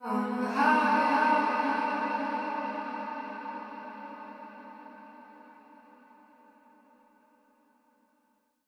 110bpm E, Vocalpack; Vocals

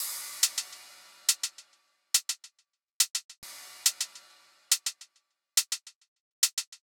Riddim Drum Loop 140 Top

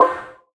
Collectibles Various FX; Cymatics; Video Game Sample Pack Vol 1

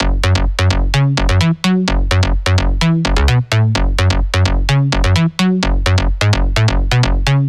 Bass Loop 128 F# Thud; Ghosthack

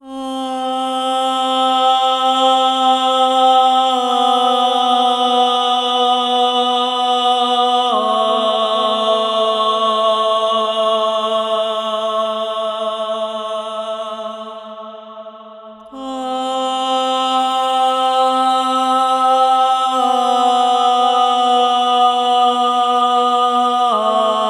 Ah Vocals - Am 120Bpm (WET); Construction Kits; Ghosthack; SkyRealm; Kit 05 - Heart Of Fire

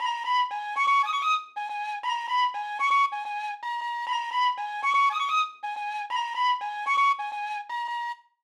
118 BPM G# Min Flute; Melody; Trap; Loop Stems; Cymatics; 2022 Melody Collection - Trap Pt 1; Great Escape

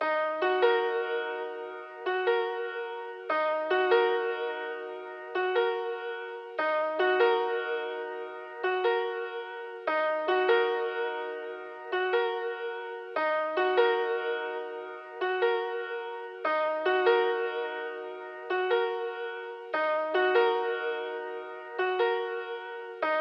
146 BPM D# Min Keys; Trap Melody Loops; Loop Stems; Cymatics; Powers

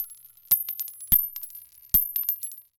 Bullet Shells; Fairlight Sound Lib

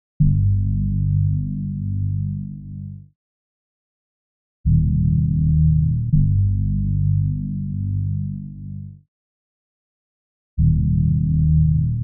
162 BPM G Min Bass, Loop Stems; Cymatics; 2022 Melody USB Expansion; Trauma

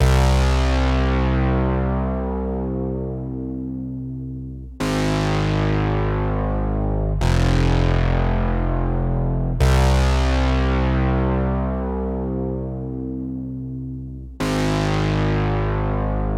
150 BPM C Min MemoryMoog Bass; Synth; Loops; Loop Stems; Cymatics; Fantasy Synth Sample Pack

